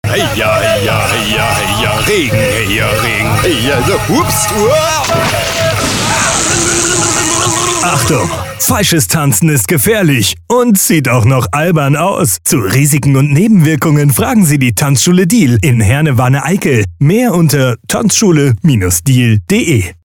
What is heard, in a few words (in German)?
klingelton regentanz